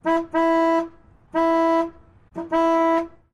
Car Horns

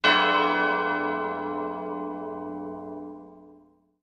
Music Effect Tube Be, chimes

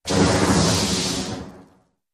Large Water Explosi, explosion

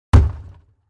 Large Bin Hit Sledge, plastic

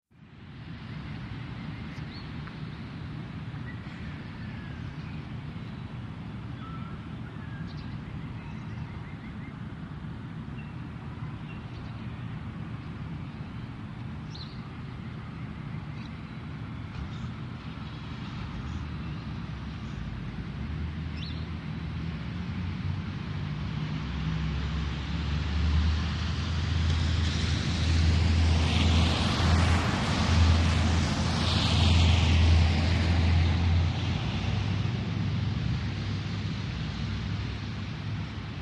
Rural Town Wet Road; big city

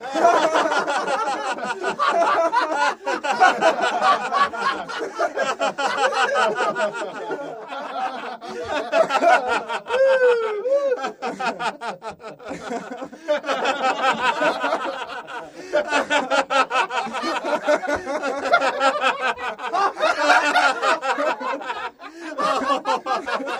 Party Crowd Indoor, teen

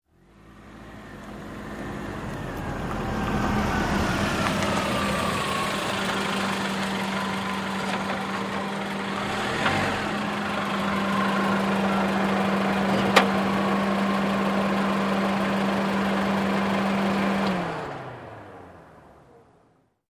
Sm Car Fwd Park Manvr, compact

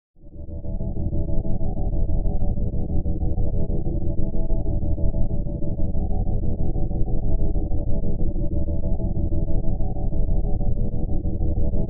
Alien Power Chamber, drones

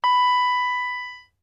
Elevator Tones

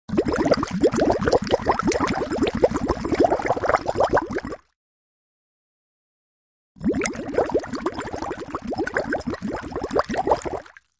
Bubbles Rapid Bubbl

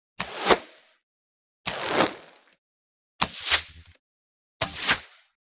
Various Arrow Types, bow & arrow

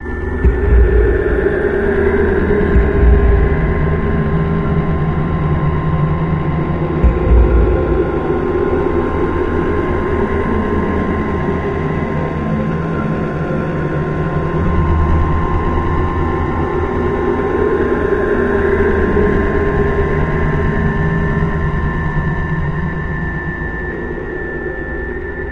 Scan Room Deeper Low Std, deep

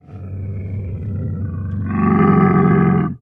Camel Groan Single; camels

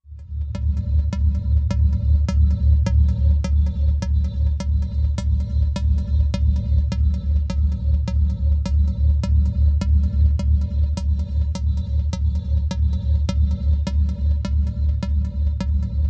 Fear Vending Machine, eerie ambience

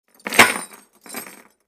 Chains Metal Down On

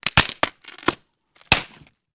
Bone Cracks Bone Crac; punches kicks hits bones

